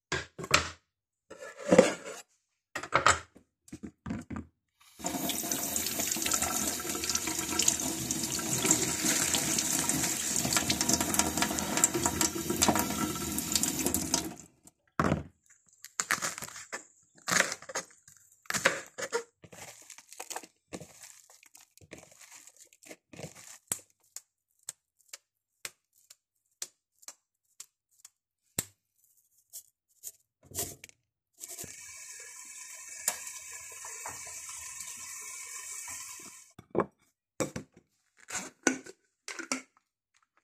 ruch

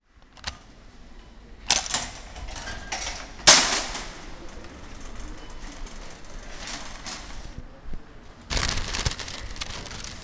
ruch

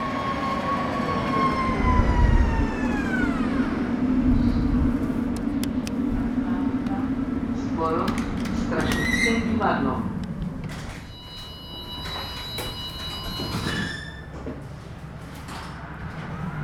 ruch

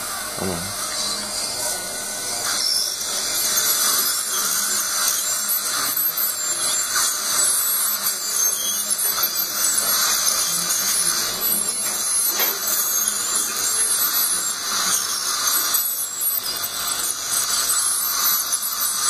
ultrazvuk dolni fronta lingualne; atlas stomatolog; parodont

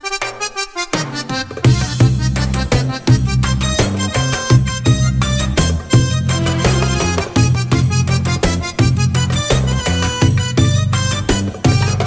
Hudba kombinace

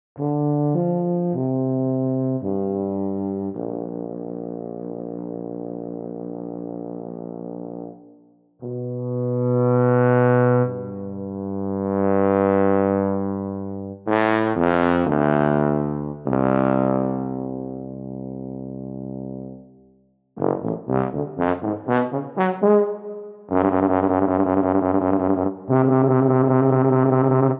TUBA celek

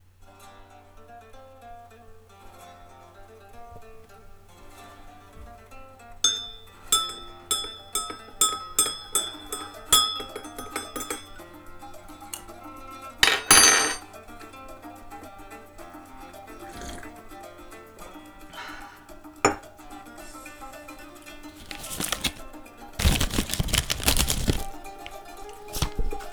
audiozurna ruch

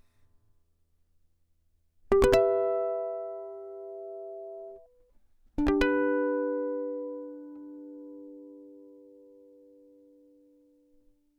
Kytara

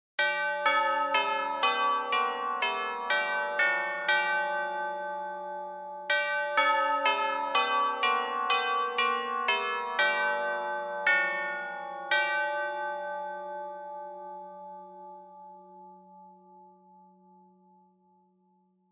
zvony

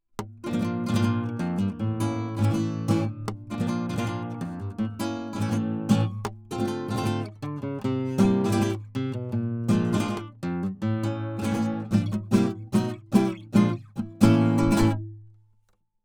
Kytara kobylka

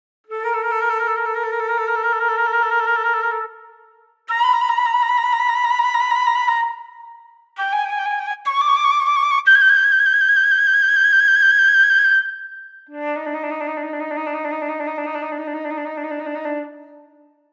FLAUTO trylky

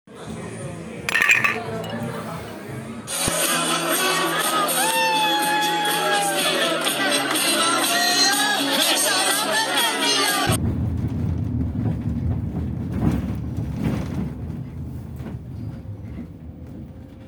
příběh ruchu

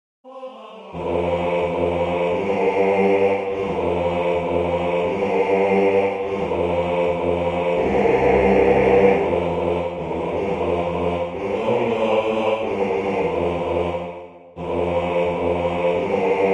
Balada o Vojčeku Bas

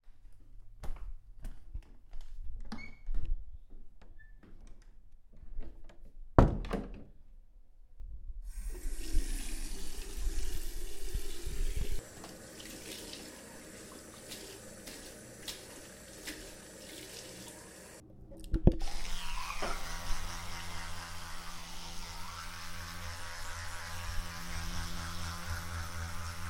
ruch

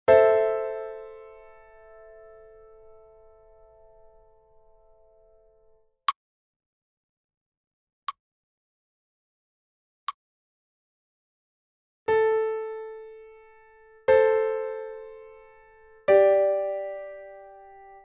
Dvojhlasa melodie ACE

